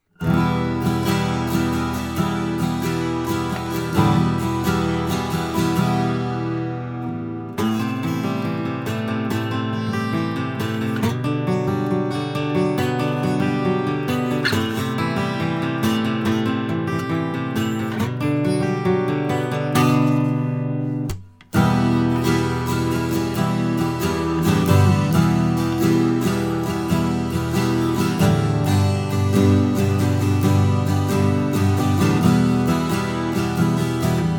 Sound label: 12 string TLM 193